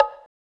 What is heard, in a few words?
One Shot Perc